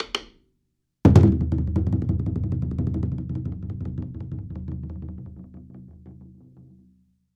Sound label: chu daiko fill roll stick alt; TKO